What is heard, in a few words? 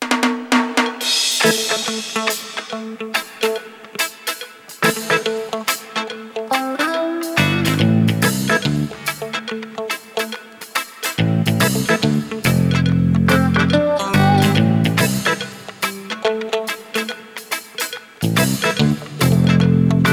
reggae short